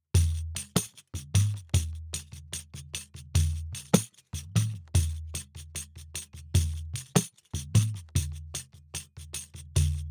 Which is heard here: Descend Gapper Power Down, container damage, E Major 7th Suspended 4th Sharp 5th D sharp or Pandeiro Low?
Pandeiro Low